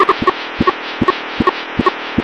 aheli mine seek loop, attack helicopter; Source Engine; video game; npc

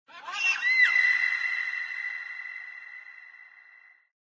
animal call bird weird; Source Engine; tf; lair

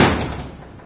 elevator arrive; bts5

